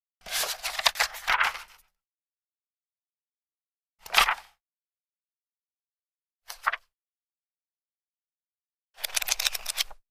Page Turn Page Flip

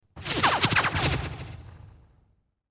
Laser Zaps Fast By; Fast By With Reverberant Warbles.

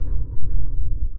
Energy Shield Overload

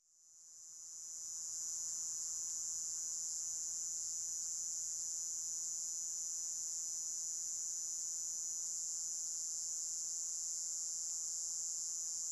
Single Cicada; Single Cicada Cu Beco; cicadas

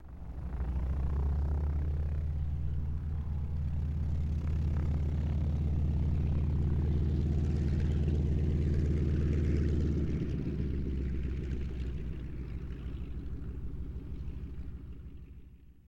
Harvard Rev Taxi

